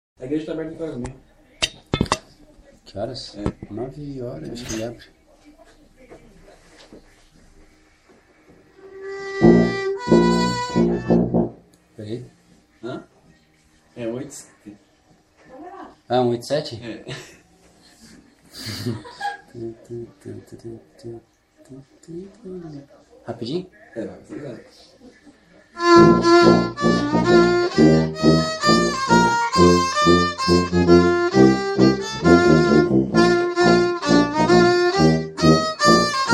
tocata tuba 3 4 e violino; ccb

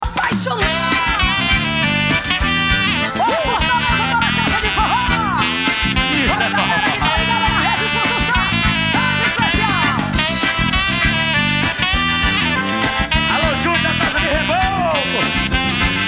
forró

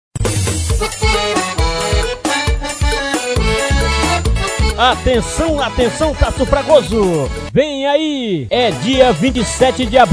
festa de forro pe de serra

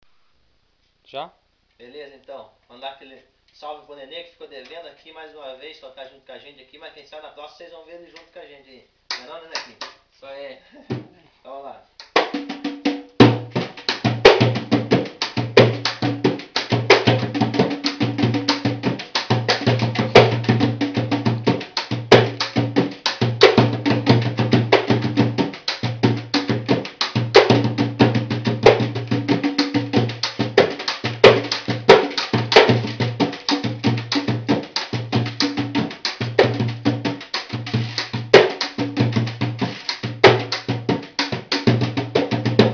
partido alto

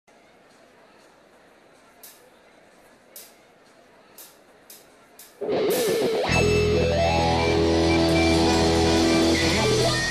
introdução

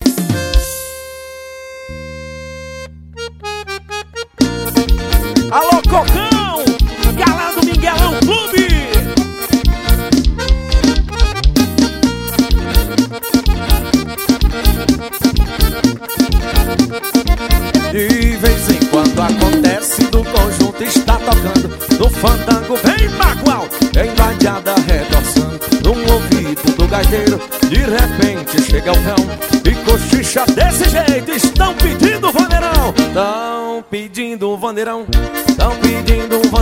vanerão

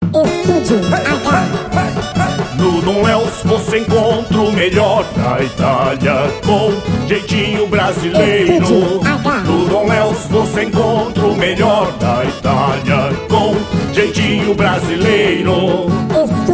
jingle pizzaria; don leos

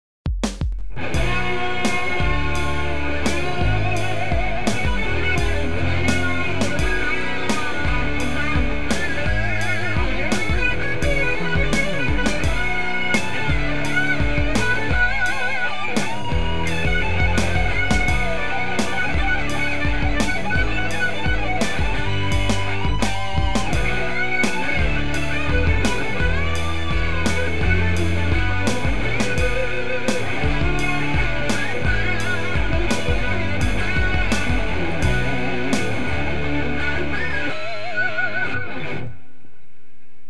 teste com samples

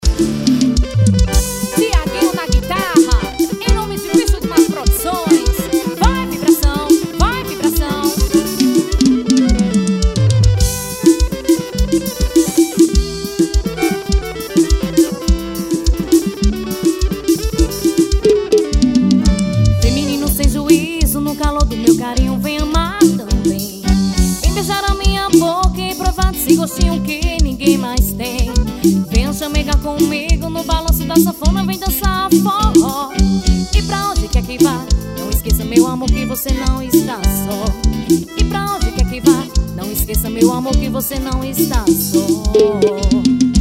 forro

